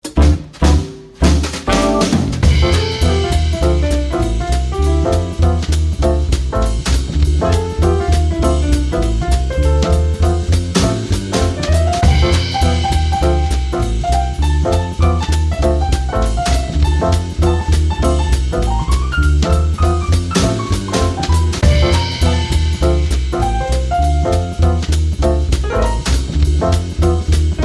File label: Jazz Ways energy jazz